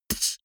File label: beatbox snare damped